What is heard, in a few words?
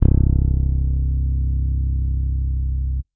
bass electronic, electric bass finger